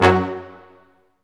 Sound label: BRS, Ensembl stac; Roland L-CD702